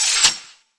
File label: spear charging end, weapons